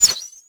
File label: grenade launcher whiz